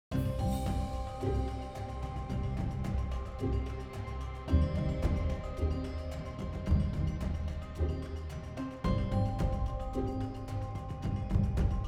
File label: Forest Spirit Trial Loop; Ori and the Will of the Wisps; mouldwood